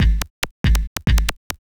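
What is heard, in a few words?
DRUMLOOP ELECTRO 140; Techno2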